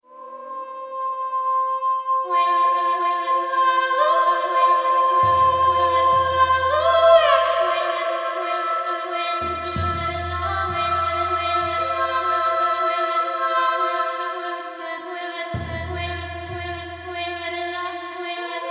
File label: Liquid Melody